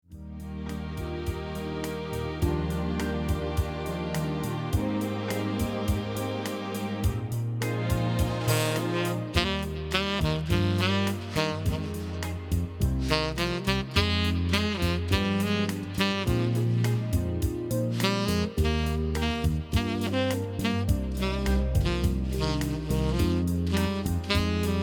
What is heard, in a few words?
Saxophonist